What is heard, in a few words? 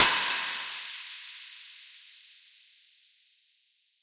Crash